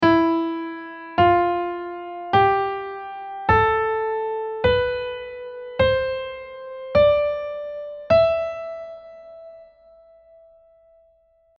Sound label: Phrygian